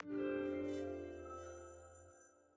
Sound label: Achievement; MiSide